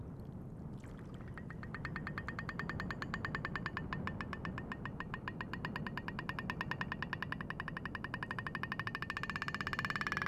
megapclick audio, humpbacks inland WA BC; biophony; Stimpert et al 2007

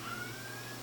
up-call, KW; Biggs

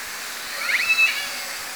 call S2iii (L version), OrcaCalls, SRKW; Alwin

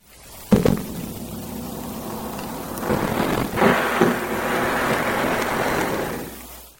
harbor seal

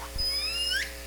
call S 19 (L pod), SRKW, Orca Calls

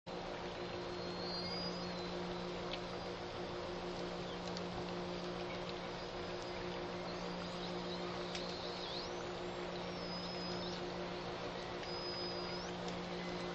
fading calls then loud ship; anthrophony; vessels; response to ship noise examples

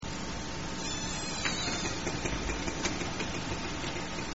high descend wail

